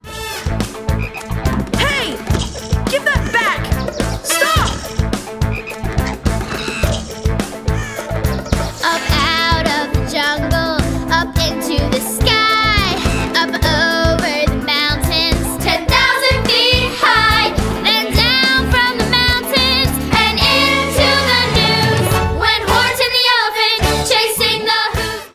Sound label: Vocals